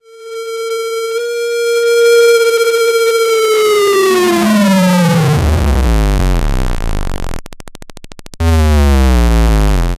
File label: 8 bit death sound